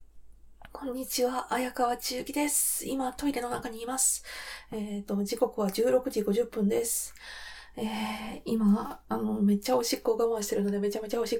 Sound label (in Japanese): おしっこ実演 ギリギリまで我慢しておしっこ編